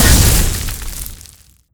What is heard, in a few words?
acid ball burst; tf2